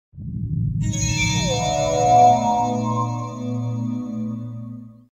Triad Sting

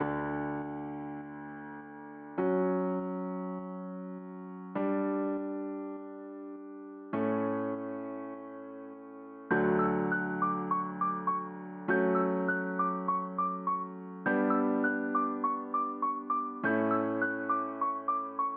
piano; inbento

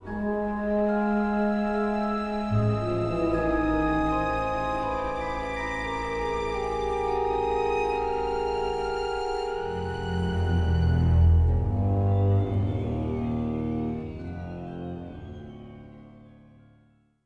Suspense; Star Wars Obi-Wan

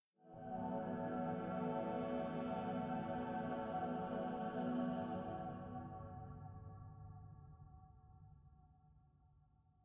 constellation Puzzle Success; Desert SFX

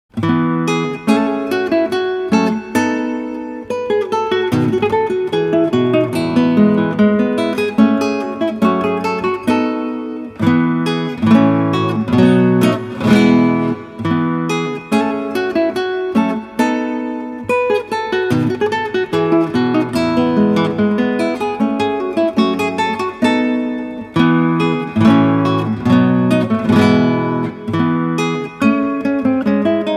Gavotte en rondeau from Partita No.3 in E; Sisters Natsu no Saigo no Hi; BWV1006; J.S.Bach

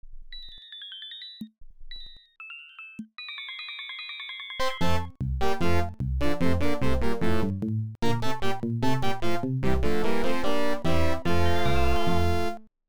Song; The Simpsons Bart's House of Weirdness; ms-dos gamerip 1992

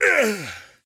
Berserker Recoil; Puzzle Chronicles; PC, PS3, PSP, DS, X360

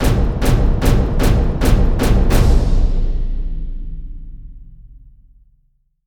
Trailer Action; dark minute kiras adventure

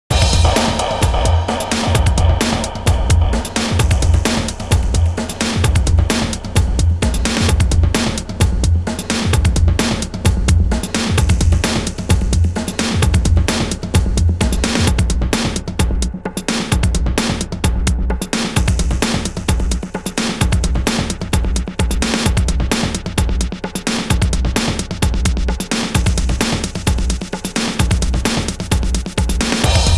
victory; unreal championship re engineered soundtrack 2003

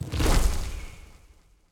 Rock uppercut; Super Mombo Quest

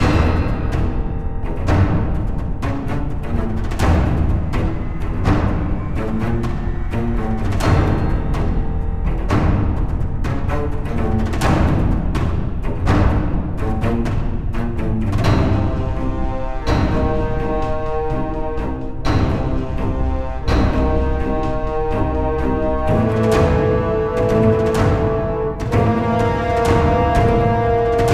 Combat; wild blood android ios gamerip 2012

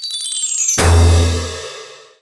Character Defence

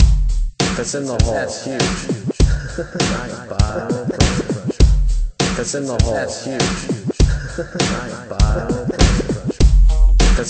Menu Loop; tiger woods 99 pga tour golf ps1 windows gamerip 1998

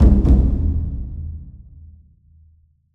Stinger Taiko

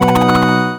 Boss Hit

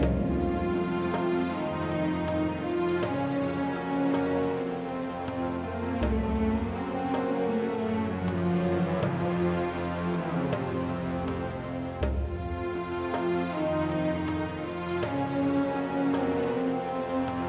Wonderment 108bpm Loop; Music Dialogue

